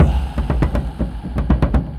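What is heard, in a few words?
Music Shield; from dust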